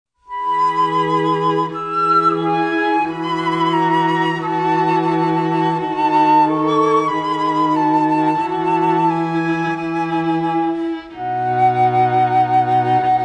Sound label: Flute Trio; Mozart Ave Verum Corpus